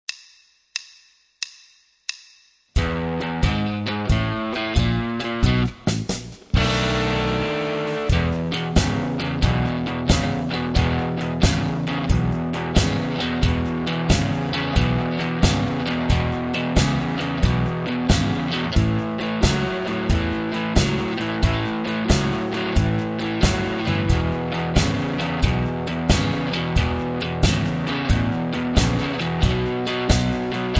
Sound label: Rock Tune Playback, Rock & Blues Solos; 10 Rock & Blues Solos; De Haske